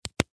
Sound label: Office Dry Erase Marker Cap On; Eraser Sound Effects; Erasers (Sound Effects:Offices); SFX Bible 6